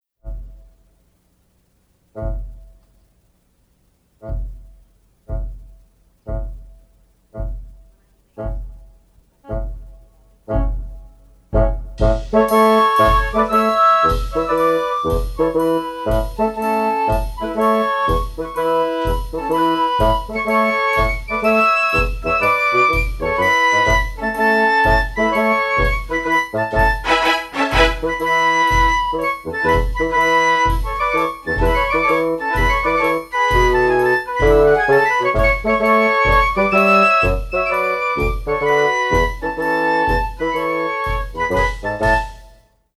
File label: Ode to Joy